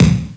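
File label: Fairlight IIx CMI Kick 3; 300 Drum Machines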